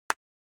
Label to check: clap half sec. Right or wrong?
Right